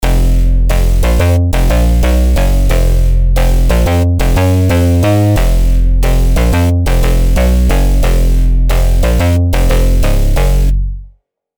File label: Bassline Fm 180bpm; MIDI; Unison MIDI Starter Pack